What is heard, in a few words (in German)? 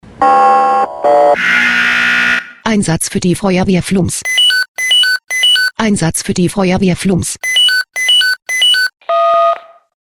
SMS Alarmierungstone; FW Flums